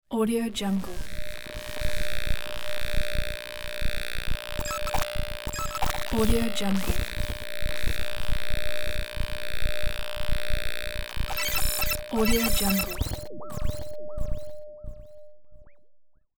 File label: radio frequencies glitch background